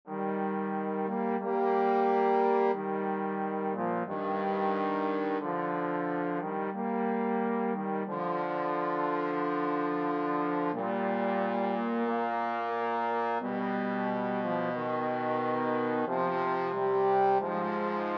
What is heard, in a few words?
largo trombone duet